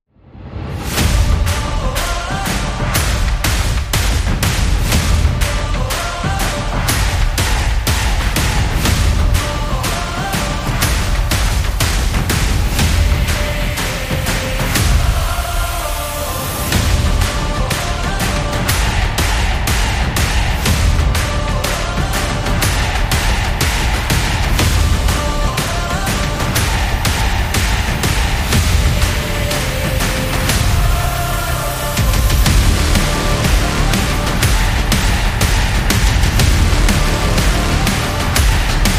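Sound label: Football Team, sport and action music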